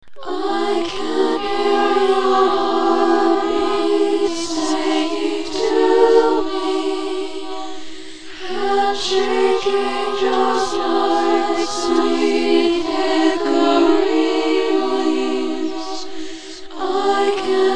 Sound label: heartbeat song choral vers